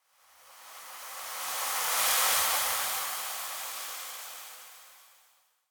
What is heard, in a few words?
Clan Dojo Sand Gust; Warframe; Dojo Randoms; Ambience